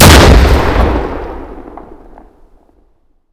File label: Inf Weapons; Red Orchestra 41-45